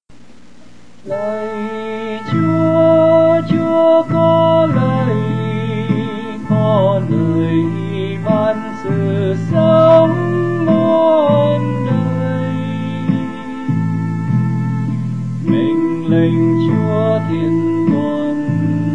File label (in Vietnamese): TENOR